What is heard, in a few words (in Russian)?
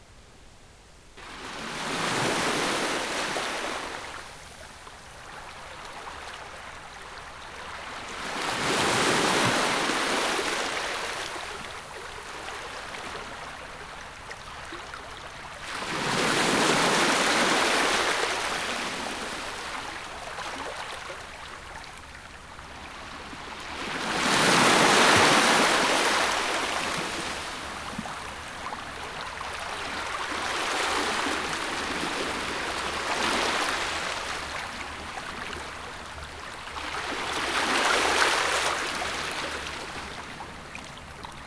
razmerennii rokot voln, zvuki prirodi